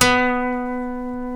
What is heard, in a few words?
C#, funk guitars